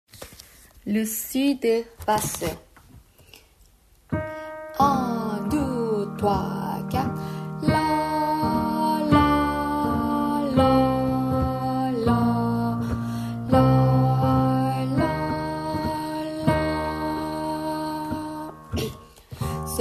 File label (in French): basse